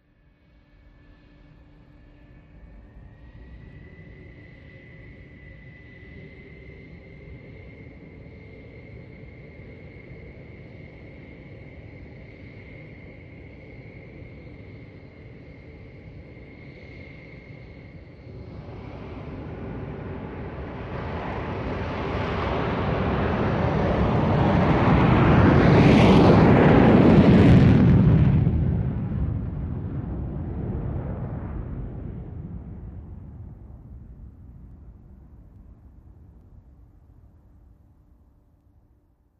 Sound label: F4 Phantom Idle Power, f-4 phantom